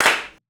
Format Phaser Clap; percussion

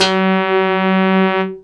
Harp Brass; instrumente

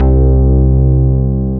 Deep Organ

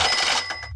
Cash

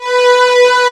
Double String high; instrumente

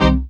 dance chord

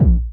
Low Kick & Attack dist

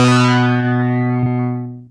Long Attack Analog; instrumente

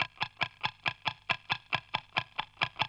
Clock Work

